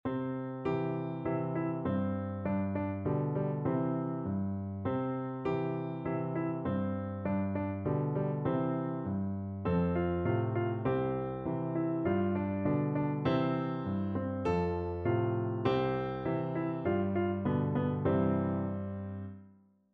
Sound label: ba ba vita lamm, piano duet